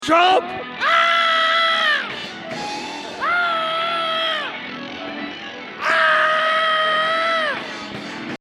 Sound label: scream ringtone